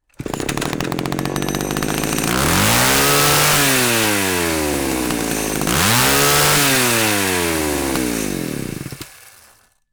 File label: chainsaw start; boss; sawer